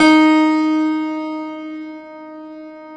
D2, Classical Piano ff +ped; PS-53 Total Piano; Brilliand Classical Piano

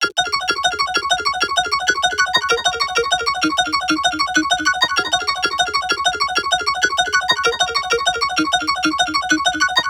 arp loop bpm97

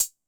606 Closed Hat; Concrete Collage Drum Kit; Concrete-606

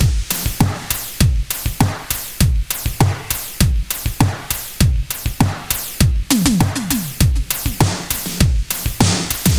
100 Beat Muffled; Zero-G; Future Wave; Beats

